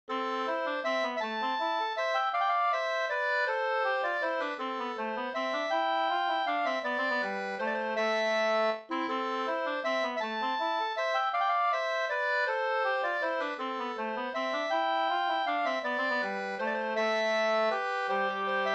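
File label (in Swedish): vals A-moll, two-part; A-mollvalsen e Byss Kalle Up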